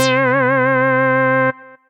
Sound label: Righteous Funk, Synth Lead; Ableton; Factory Packs; Synth Essentials